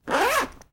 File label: zipper bag